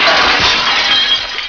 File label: Synthesizer Crash; konami